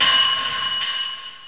random echo

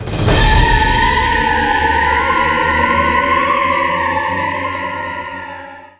puke wtf

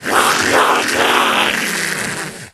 burning

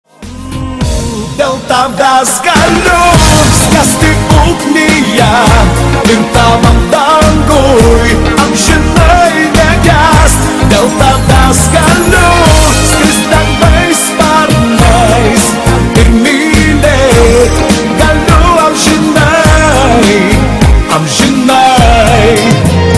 song

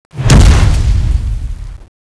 attack

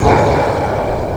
pain; nemesis

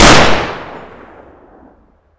fiveseven; ghost ws; weapons

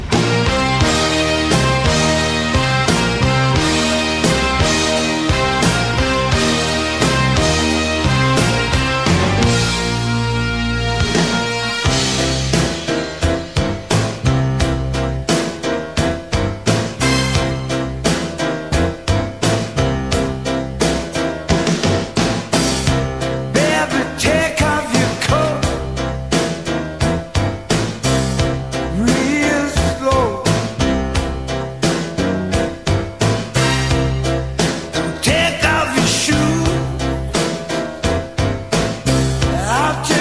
musika

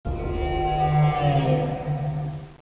the deep moans

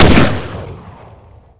usp unsil; ghost ws; weapons

